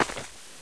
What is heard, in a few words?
dirt; echoes